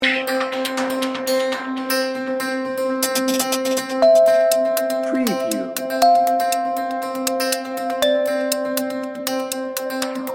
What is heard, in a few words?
atmospheric and dreamy